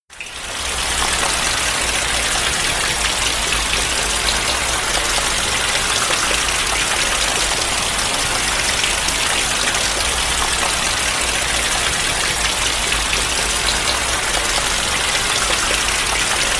regen klingelton regentropfen sound

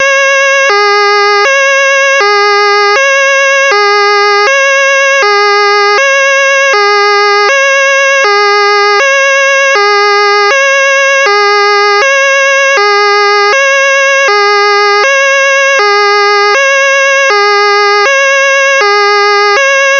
hella polizei feuerwehr und rettungsdienst sondersignal; hella rtk qs; din1461

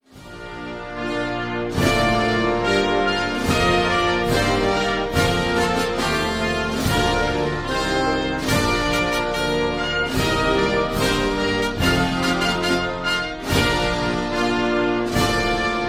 nationalhymne Spanien, marcha real

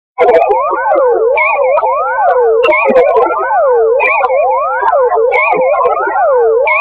soundeffekte space wave

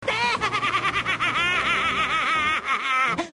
spongebob schwammkopf lachen

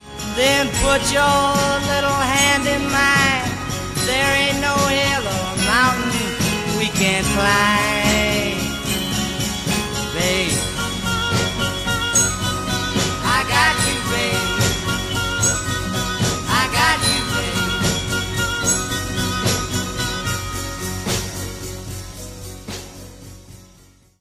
wecker klingelton; und taeglich gruesst das murmeltier

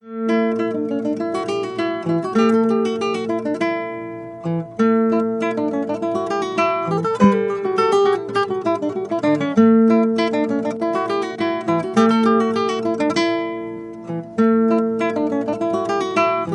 klingelton laute oud

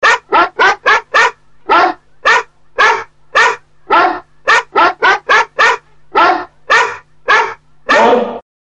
Hundechor; hahn

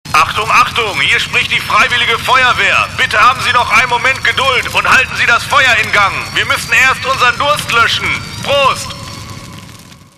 feuerwehr

